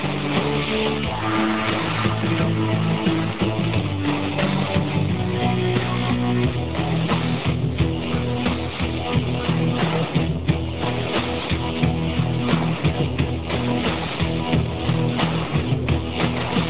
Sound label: Funky Music